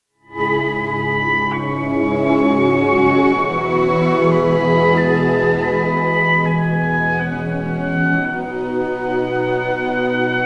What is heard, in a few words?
violin concerto; samuel barber; ulster orchestra